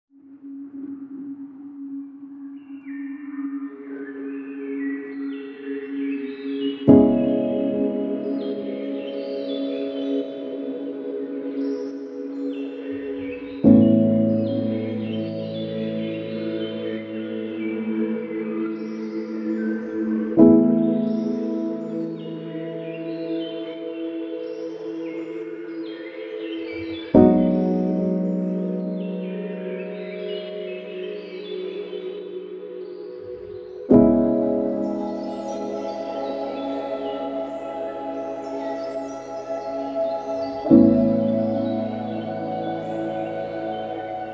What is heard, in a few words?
relax in the forest background music